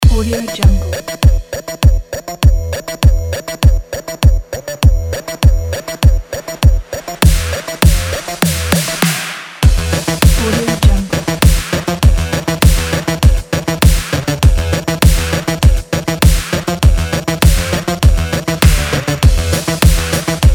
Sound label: sport action; timtaj